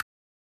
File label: Unpause; Frogger; SNES